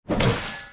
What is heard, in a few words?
bucket attack